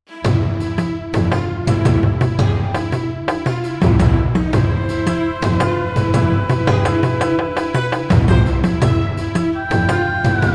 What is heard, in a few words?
Persian Duel